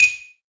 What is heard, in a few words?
xylo eb7